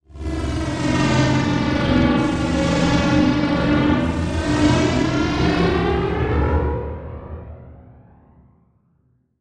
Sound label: Transition Whoosh Warble; Production Element